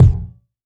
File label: Yamaha CS1x Drums